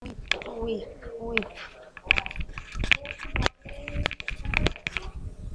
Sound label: whip